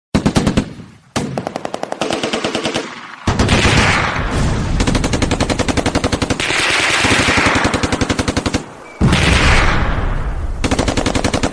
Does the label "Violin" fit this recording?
No